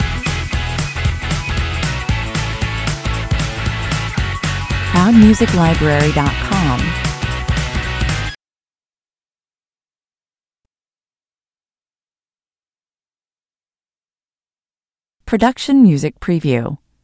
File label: rock me indie (loop)